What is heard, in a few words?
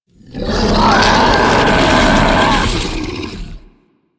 Ancient Tank Player Spotted Scream, Infested; Warframe